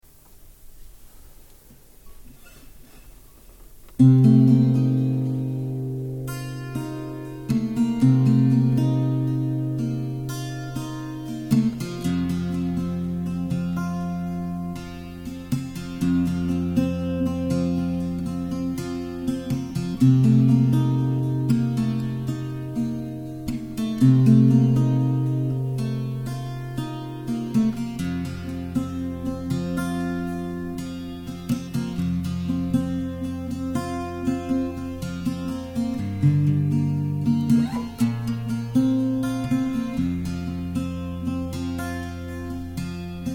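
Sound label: F 120 guitar alternate tuning; GRig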